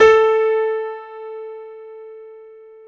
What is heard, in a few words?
PIA MICRO, MICRO-PIANO, Roland